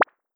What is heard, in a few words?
cute pop